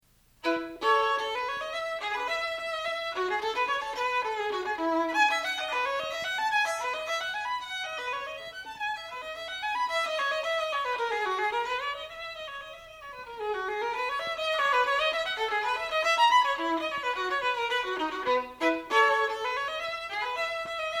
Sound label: bourree; bach; bwv1006